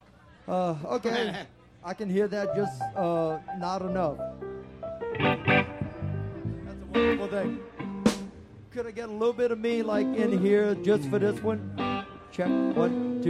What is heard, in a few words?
tuning